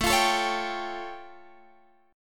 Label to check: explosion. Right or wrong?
Wrong